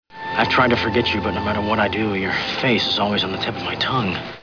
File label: tongue